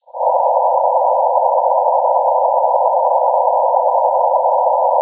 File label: Sound Sample MT63-500